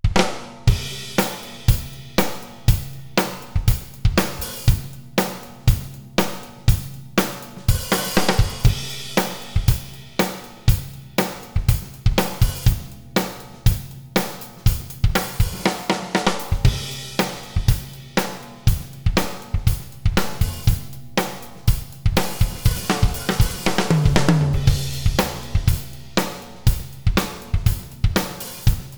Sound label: drums vintage plate fat drums